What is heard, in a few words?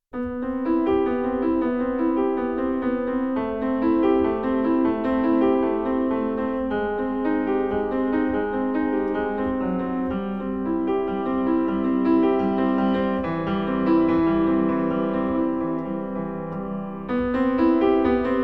etude for piano; nonesuch